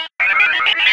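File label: sphere blips large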